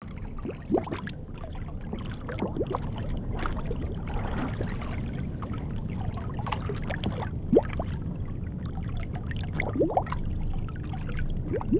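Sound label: water bubbles; Smeshariki Cartoon Studio; Voda Puziri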